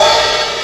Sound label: Casio RZ-1, Drums